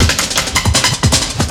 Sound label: Break, Drum N Bass Percussion